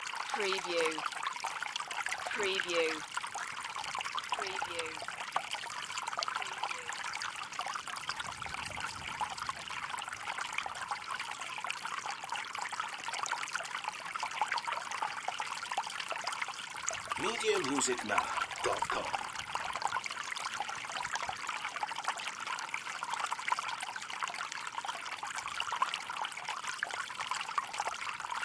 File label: Small Stream Loop